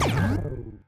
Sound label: wrong answer